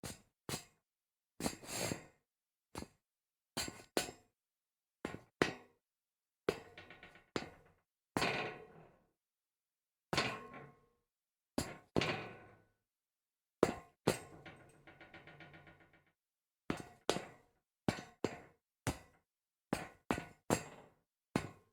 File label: shuffling feet metal grate